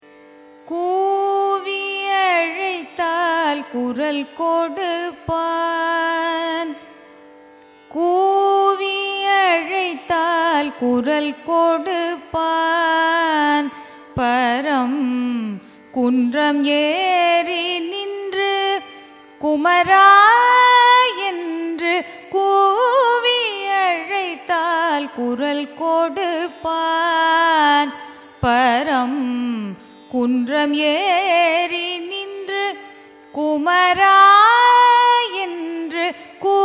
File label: raga valaji carnatic; carnatic music